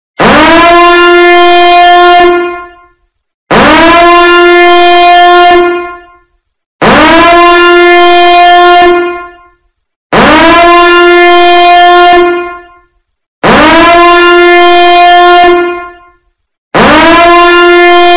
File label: Warning Alarm LOUD; sfx